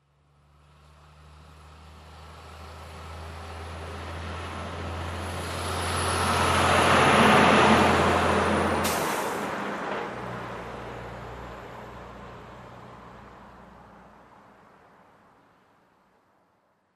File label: Truck passing by slowly